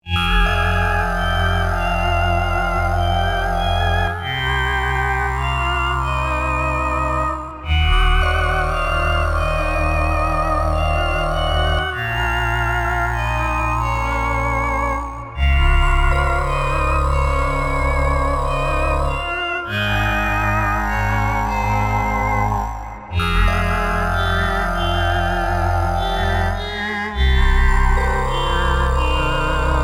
PPG Wave Computer 360 Wavesound

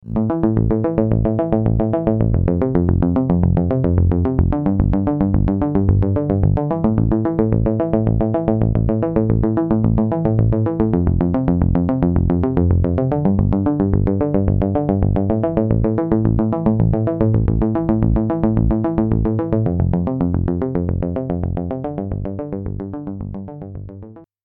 Arturia DX7V

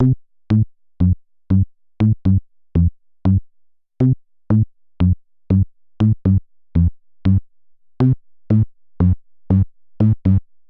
Zendelay; Ninja Tune

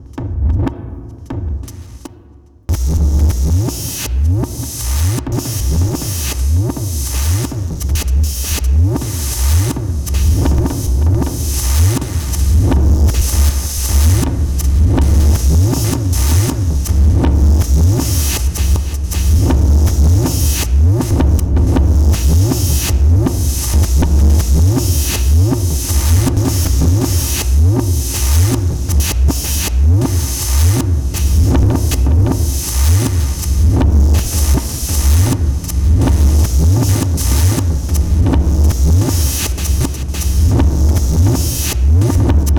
Delay Drum Chorus; BIAS Pedal

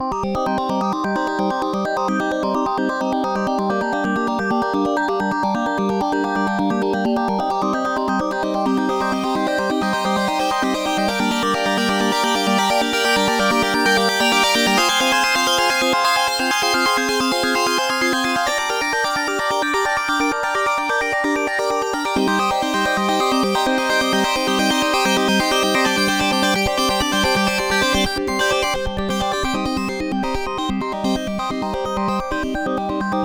3rd WT Random Arpeggio Filtered; Groove Synthesis

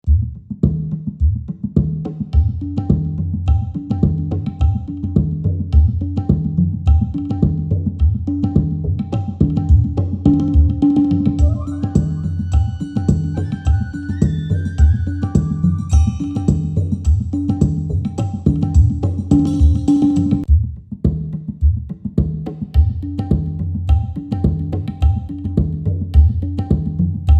Tablas

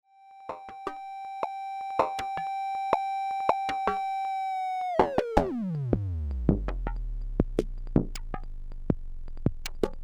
Drums; Dr Strange

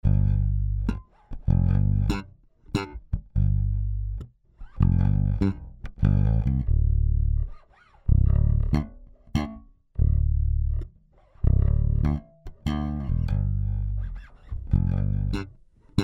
Bass Stomp Dry Wet

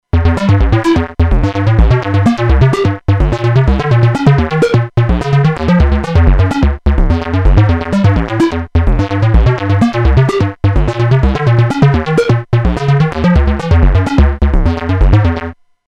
Acid8 MKIII Key Change Sequence

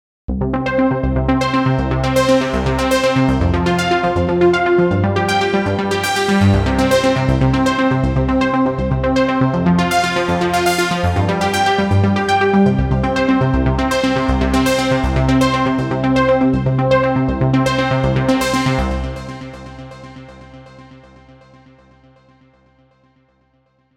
A Nice Arp

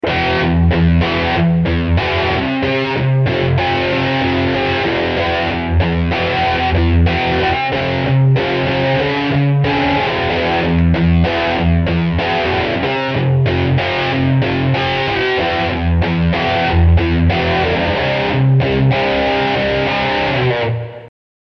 9ths ahead

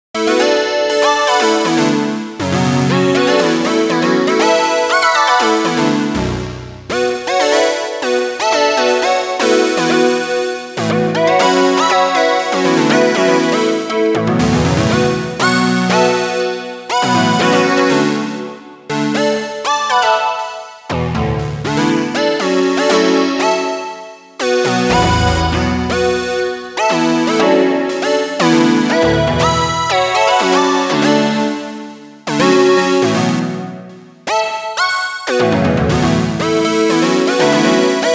Layer; Soniccharge Synplant 2 Isasound

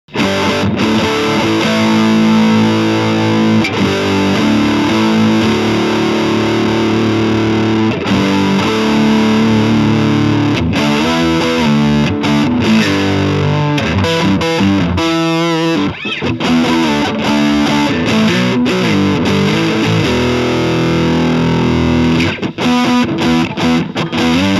gain full

